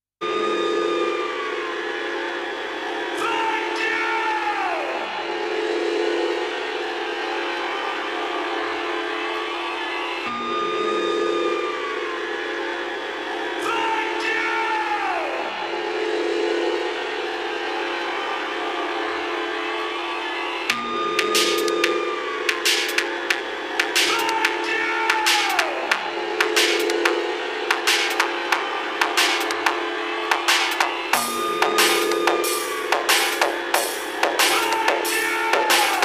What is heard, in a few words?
techno